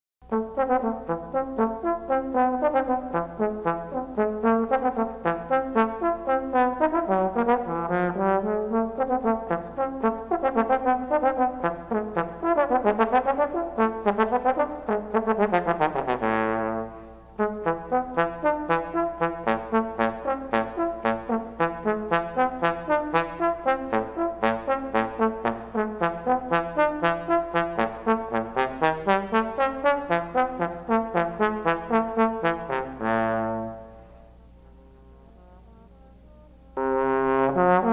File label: Trombone